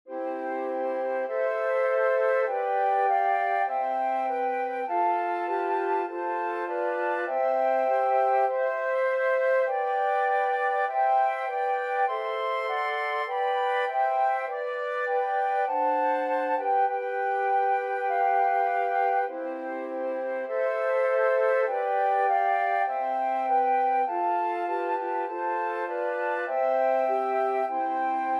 holy holy holy, flute quartet

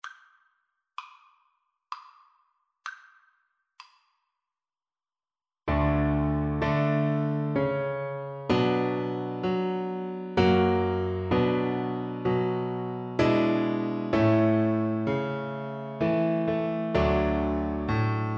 star spangled banner, oboe

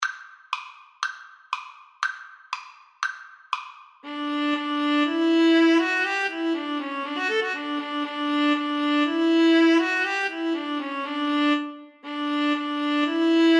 Viola Cello Duet

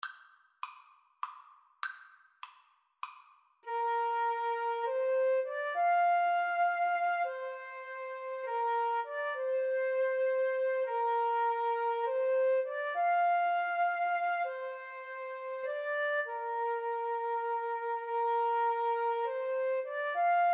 suo gan, Flute-Clarinet Duet

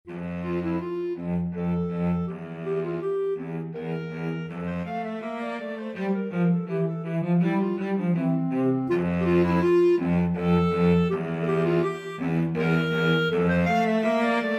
clarinet cello, clarinet-cello duet

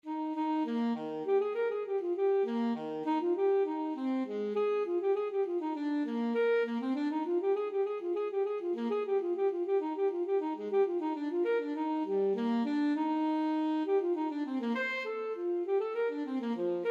cello suite courante, saxophone; bach

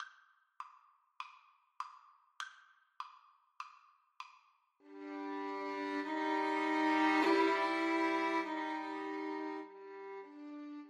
Violin Duet

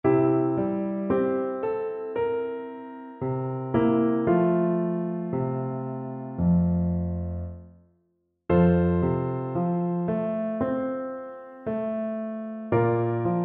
Clarinet

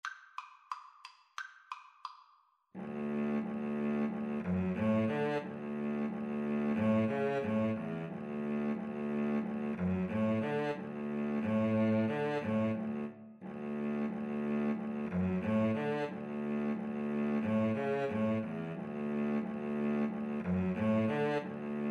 Viola Cello Duet

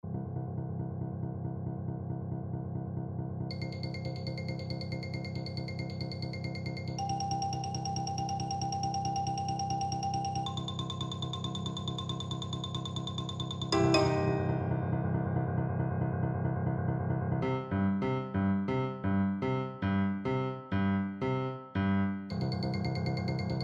also sprach zarathustra, percussion

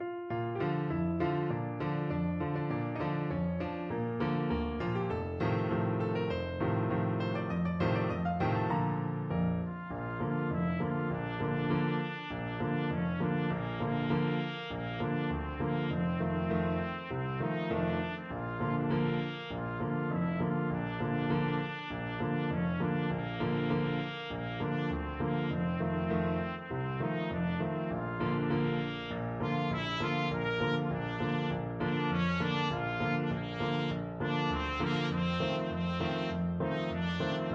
ta ra ra trumpet

